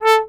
Trombone staccato A3; Orchestral; DirectWave; Varazdin Orchestral

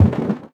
One Shot, Hits

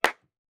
Snares & Claps, One Shots

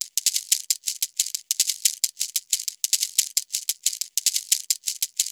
ETHNO PERCUSSION 90; ethno2

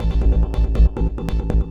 Industrial 140

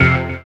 dance chord

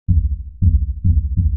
PERCLOOP TEKNO 140; Techno2

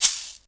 SHAKER

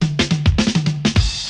FUNK FILL 104, drum; BREAKS

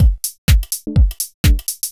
HOUSE BEATS 125

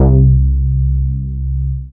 BASS MINIMOO

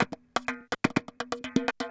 PERCLOOP ETHNO 125

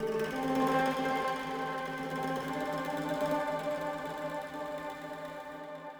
ethno, 160, C

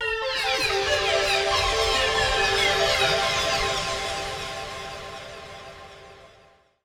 TECHNO PAD 140; Keys

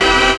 ORG, DANCE ORGAN

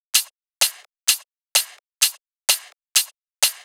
drum loop 128 Top; Anthemic

